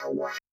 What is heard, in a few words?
Amb Hit; Yamaha DTXpress-II